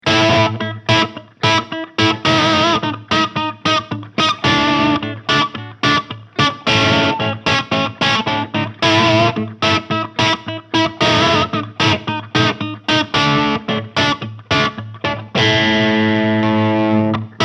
ESP LTD Eclipse ´87 Rainbow CRK Crunch SC HB

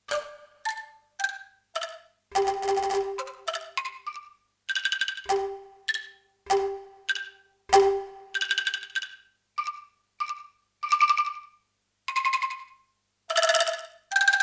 KORG MPS10 Anklung

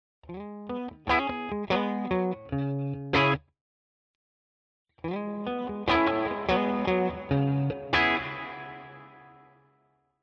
Walrus Fundamental REVERB Hall

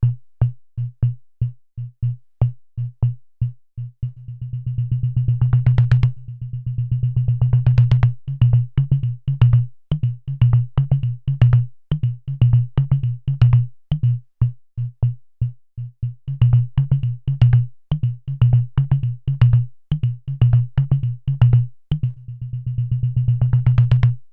hand drums 170

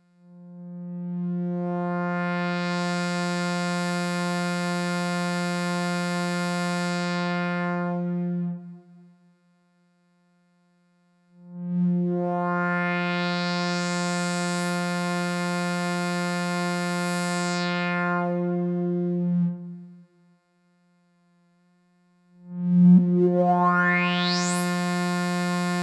Filter Reso

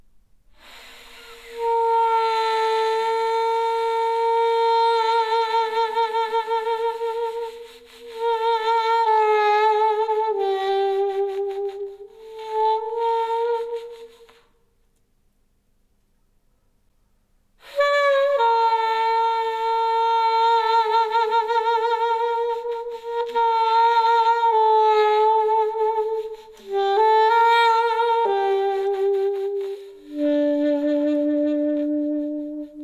Saxophone Solo Attack 40 Release 250 3 1 Warm

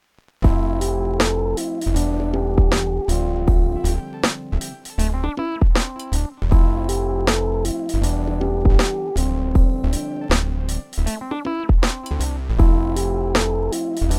Sh-4d Sweet Keys

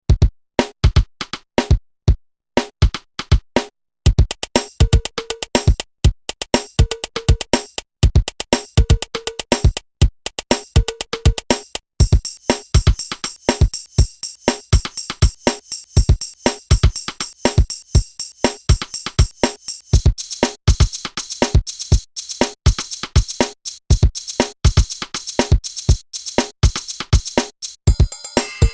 TOM Basic Perc

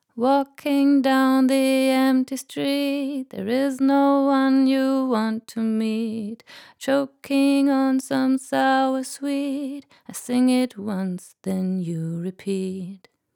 Vocal EQ Tube; Gyraf Audio G14-S

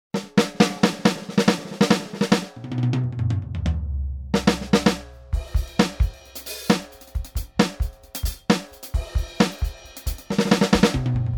Hi Fi; ATV a5D; Steven Wilson Kit

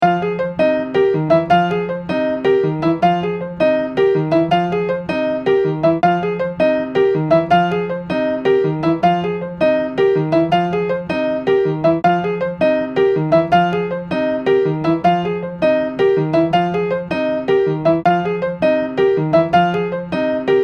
Piano Clean

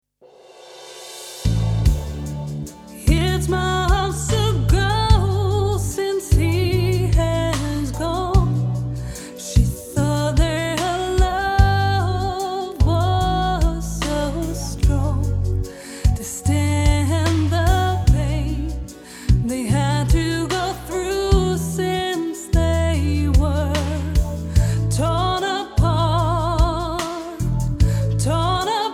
Reverb Eventide H3000

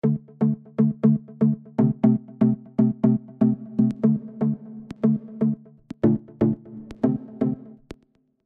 Tight Pluck Rhythm Wet

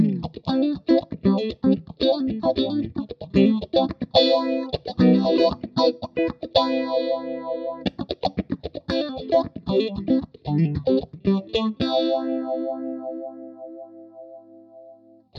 phaser

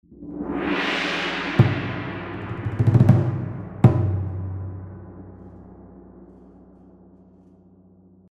Jaeger Percussions

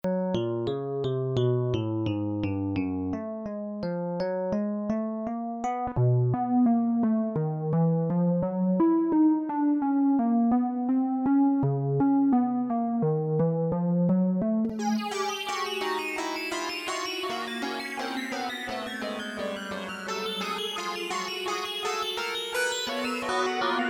AIR Hybrid Patches

